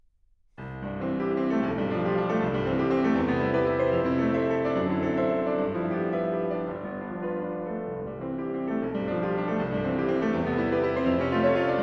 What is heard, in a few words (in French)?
En Do Majeur Agitato, Piano Solo; Chopin